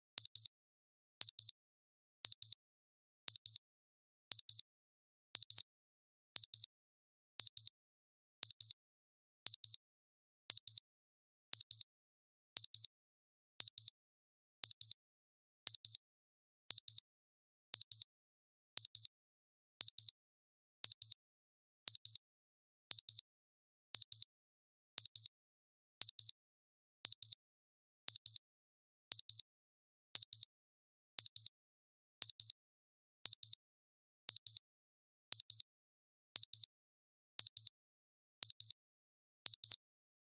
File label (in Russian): minuta do vzryva